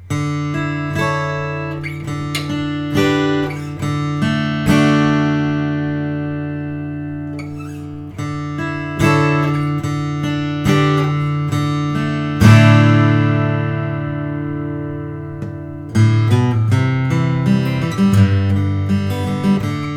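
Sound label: Bourgeois OM adirondack natural; db signature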